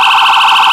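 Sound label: priority, sirens; gmod; vcmod; els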